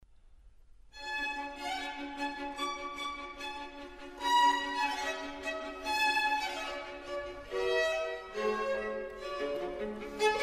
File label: Piano Concerto in D Major; Haydn